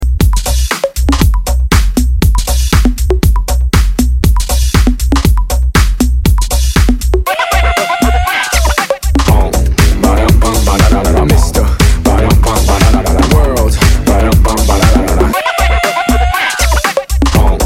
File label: Clean Extended Redrum; remixplanet